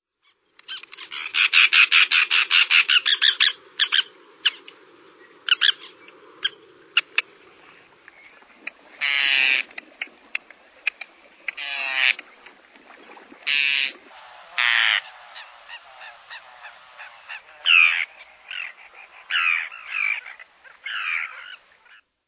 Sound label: Forster's Tern; Birds